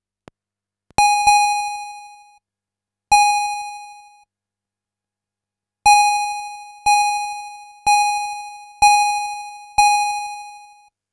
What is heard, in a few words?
startup, pinball